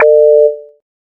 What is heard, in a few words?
blip; hl2